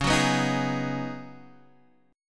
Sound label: C sharp 7th Suspended 2nd Suspended 4th, C sharp, chord; down; chord shape